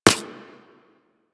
Laser Impact Generic; Surface

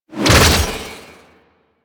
Archwing Prime Sword Short Whoosh; Warframe; Weapons

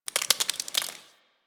Ice Thaw Drip; Ambience; Warframe

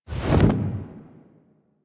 Exit Aim Glide; Warframe; Tenno

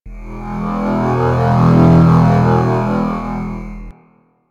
Engine Hum; Warframe; Liset; Hub; Machines

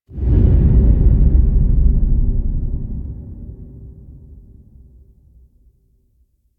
Sentient Throne Room Intro Boom; The New War

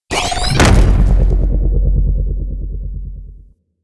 Shield Deploy; Tenno

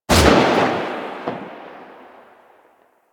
Burst Rifle Fire; Weapons; Grineer

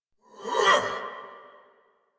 Attack Effort; Warframe; Dax Ashigaru; en

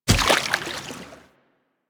Step Water, Footsteps; Warframe; Kubrow

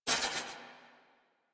Draw Release; Warframe; The New War; Quests; Weapons; Omicrus

